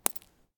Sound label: Shell 39mm gravel; STALKER; IWP